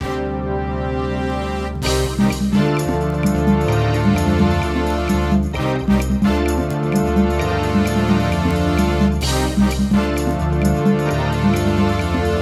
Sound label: 70s, retro music; By Burak Sound Bank; retro music vol 1